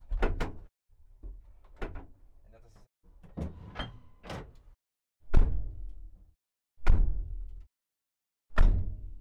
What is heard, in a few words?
Porsche, 997, Cup, Door, Various, Interior; 2012; DPA4021